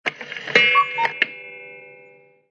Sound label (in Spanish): reloj cucu